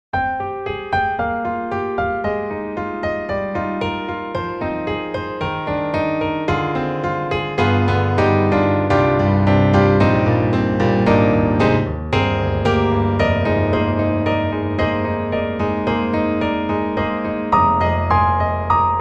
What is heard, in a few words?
Truepianos Amber Ambient; 4Front